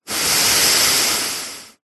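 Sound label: human male exhale breath out through nose; zapsplat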